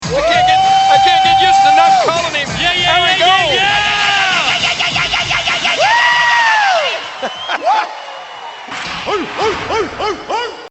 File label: woooo yeah yeah yeah